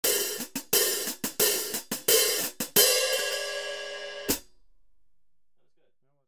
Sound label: 13in HiHats, cymbal sound